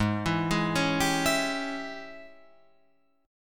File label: G sharp Major 7th Suspended 4th Sharp 5th, Guitar, Open F; G#M7sus4#5 Chord